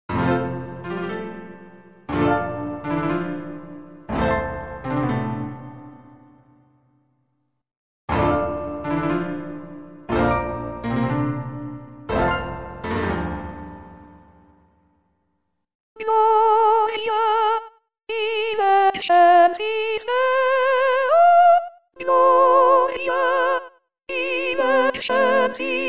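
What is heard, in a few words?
soprano; Rossini; solonnelle; gloria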